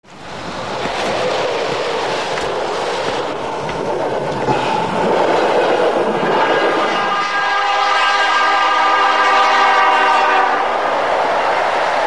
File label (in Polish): pociag